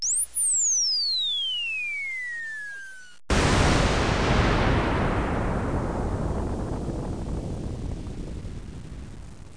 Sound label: bomb; Compton's Interactive Encyclopedia